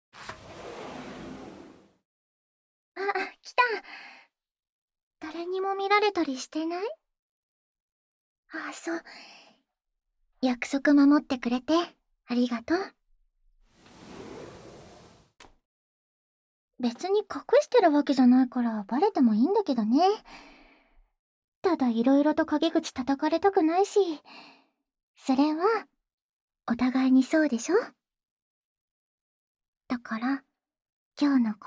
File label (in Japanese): 普通のキス、はむはむキス; 落ち着いて？ まずは私の言う通りにやってみて？; 青春べろちゅー委員会